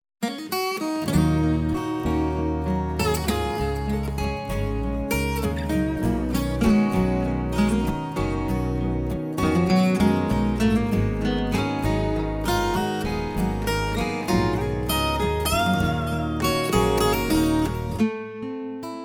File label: acoustic; Mooncalf Recording Studio